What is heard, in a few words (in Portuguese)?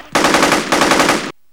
METRAL, EFEITOS